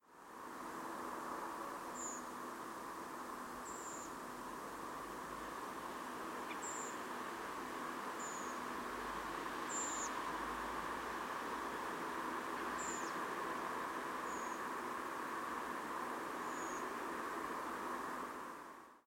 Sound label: redwing calls